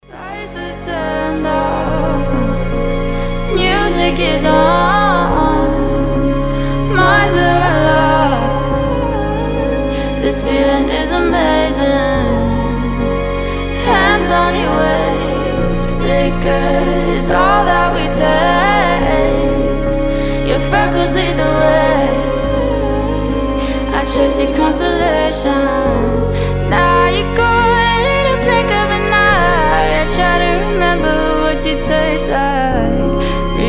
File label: piano version